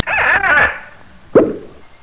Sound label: KISS POP